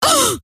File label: gasp, scientist female